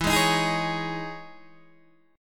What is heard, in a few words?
E Major 13th down Guitar DADGAD